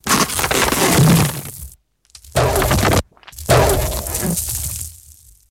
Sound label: Earthquake 3 Parts